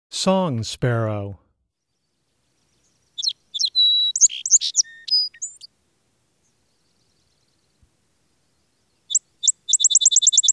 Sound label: Song Sparrow, Wildlife Sounds